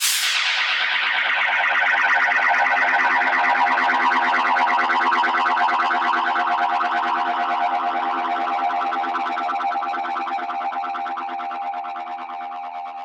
FX Impact

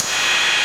Ride, Dubstep One-Shot Drums

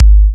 Bassdrum

